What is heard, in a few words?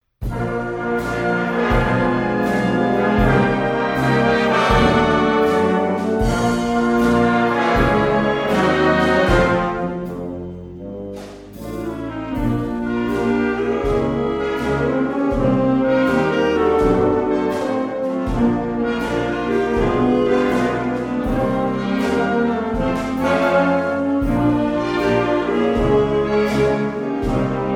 old jazz history slow